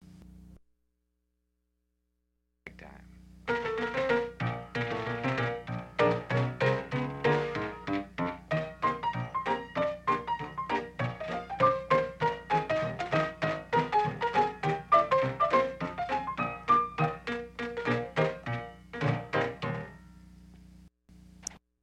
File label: rinky tink piano